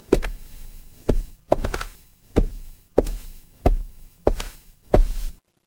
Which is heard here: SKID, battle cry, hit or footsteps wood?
footsteps wood